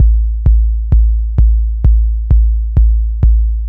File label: Anabeat Kick